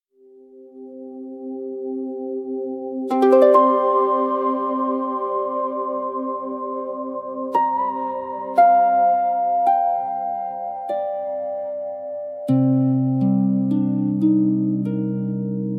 quiet japanese ambient for spiritual; unity and beautiful scenery

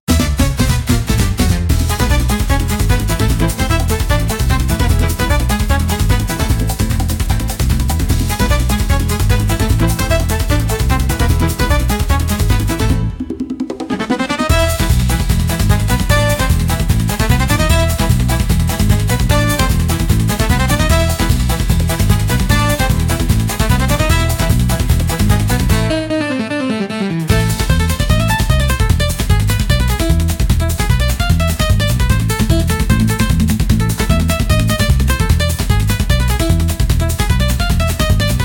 Marcha do Samba, upbeat carnival; for festivals and parades

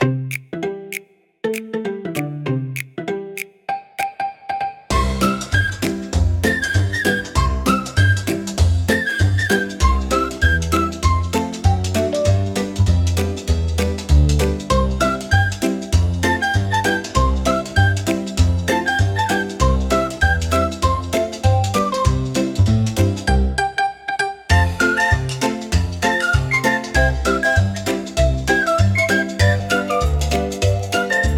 comical marimba for funny scenes

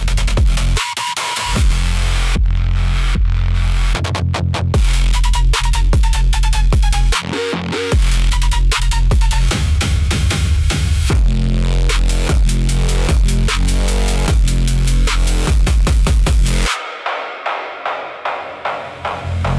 Cracked Siren Run, aggressive panic

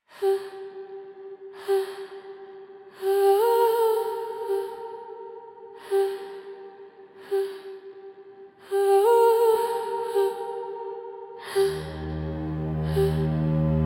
spiritual spooky soundscapes

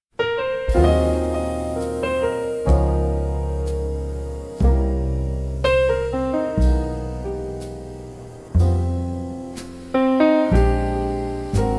bossa nova acoustic for bright afternoon

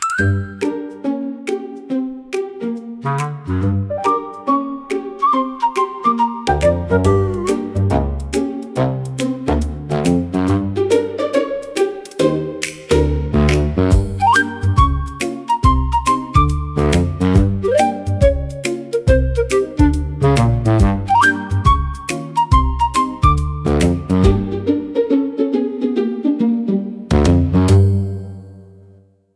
toy piano march for kids pets videos

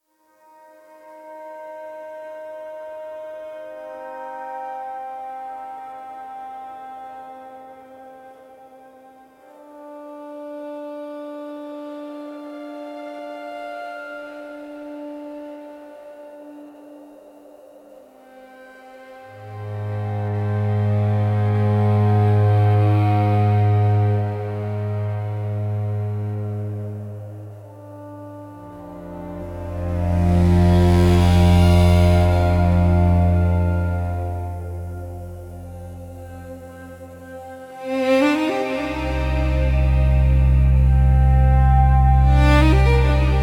Glass House Echo, spiritual spooky soundscapes

